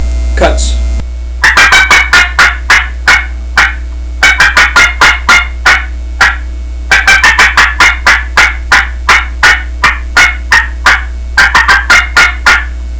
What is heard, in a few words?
challenger inverted v cutts, turkey; Southland Sounds